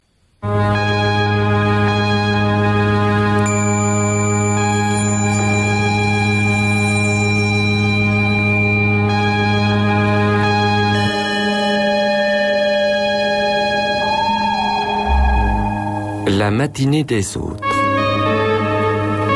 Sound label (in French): generique